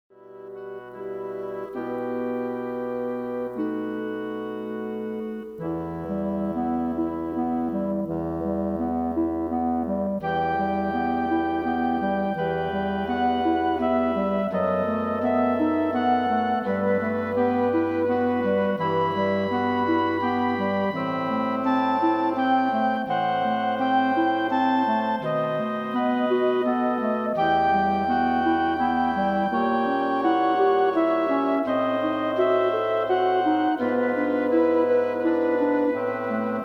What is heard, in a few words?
WW5 Piano Concerto; WW Quintets; Mozart 23rd Piano Concerto